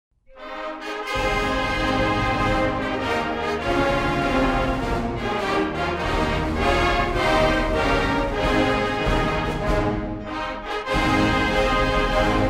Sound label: instrumental